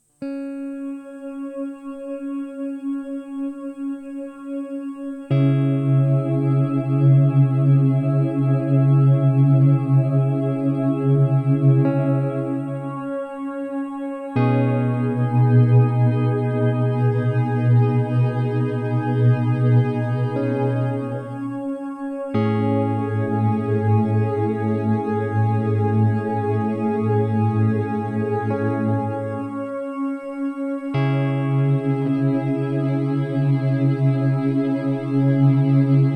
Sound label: Freeze Chorus Modulation Octave Bitcrush; Chase Bliss Onward